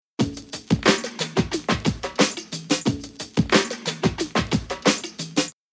Rhythm Hip Hop Lo Cut

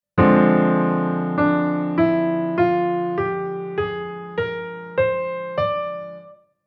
Chord Chord Scale Modal

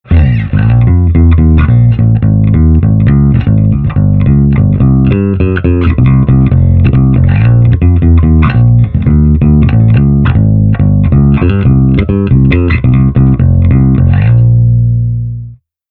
Bass Amp Sim Cabi On 1x15 Cone

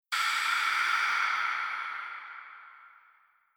Reverb Delay Hit; Mix Insert

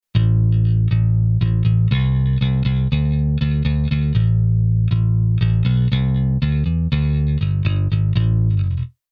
Bass Arr Rock Bass

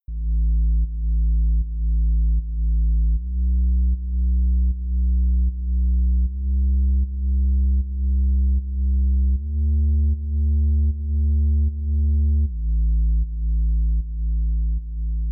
Future Bass Rough Arr Bass Tri

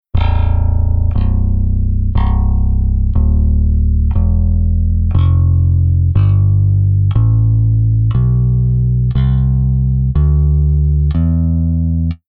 Bass

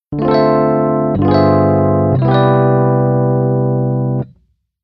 Keyboard EP Soundmake

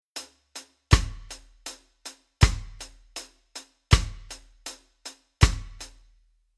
Rhythm Reggae

